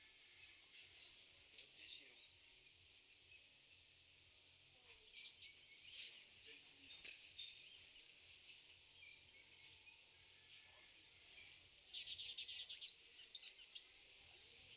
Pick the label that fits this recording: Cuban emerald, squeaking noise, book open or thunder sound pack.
Cuban emerald